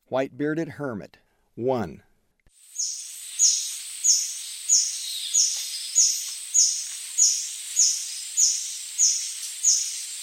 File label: White-bearded Hermit; S. America; Eastern Lowlands